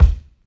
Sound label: bass drum single hit c sharp key; kick drum samples